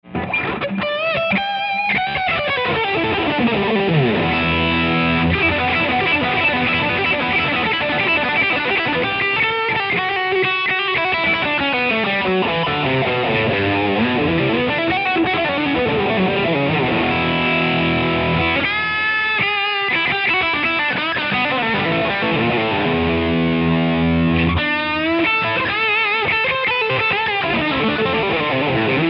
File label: Zen Master Lead